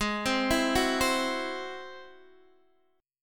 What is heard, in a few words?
Ab Augmented 7th Ab Guitar DADGAD; arpeggiated